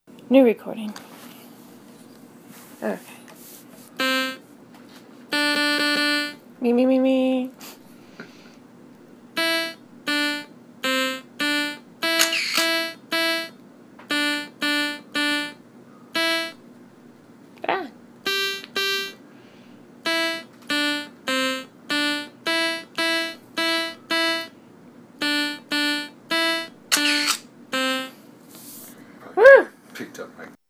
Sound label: mary had a little lamb plus photo clicks